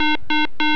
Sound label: System Busy Alert, scanner